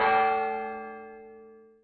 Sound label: hammer Sound; Pwnage hammer